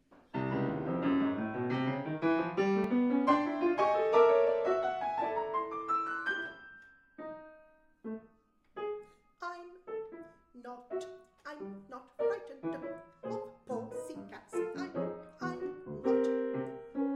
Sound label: hippo song